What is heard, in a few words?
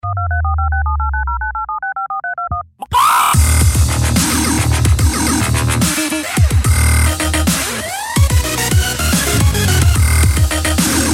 Dubstep Chicken